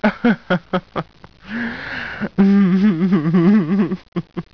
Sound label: ha ha